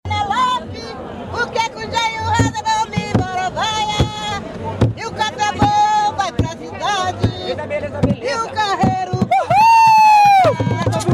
jongo de guaratingueta; engenho novo

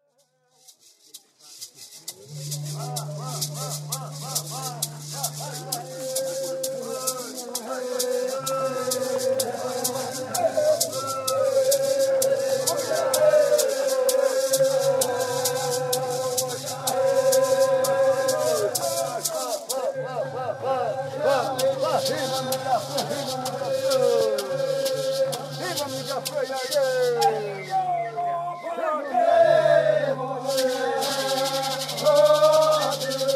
instrumentos

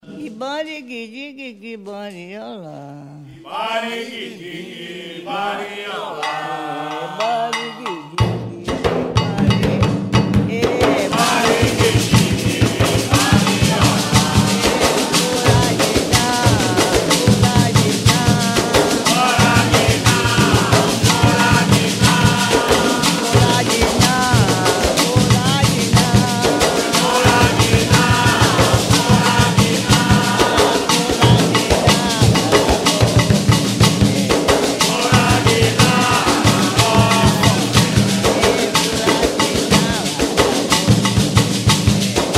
toada para xango

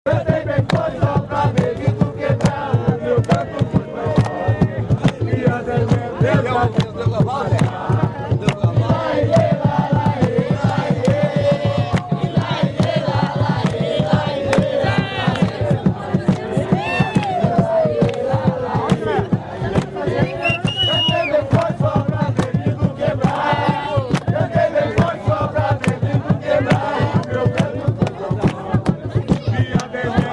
jongo de guaratingueta; cantei bem forte

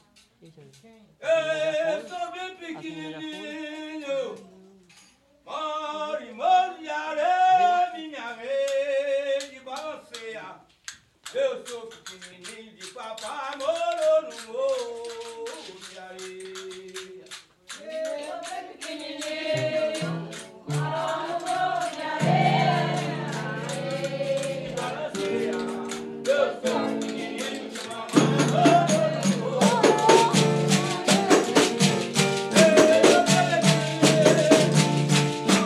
fanti baiao de princesas; bem pequeninho

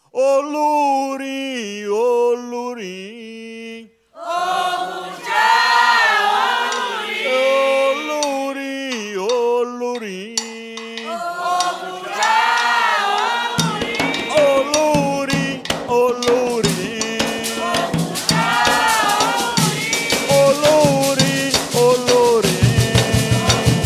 toada para ogum

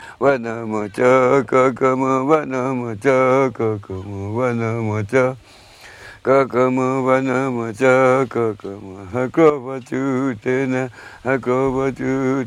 timbiras

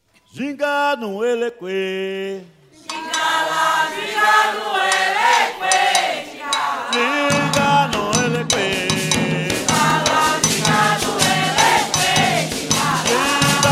toada para xango

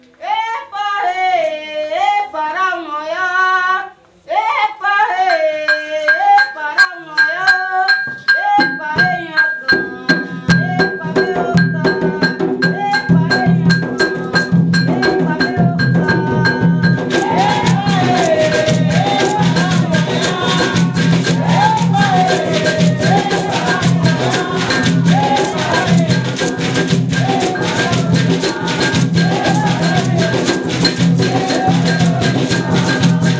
tambor de mina; fanti

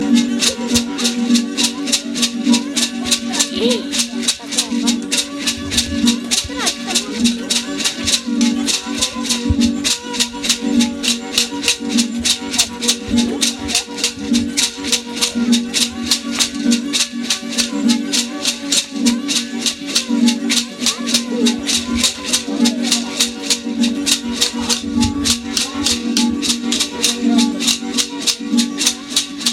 guaranis aldeia silveiras

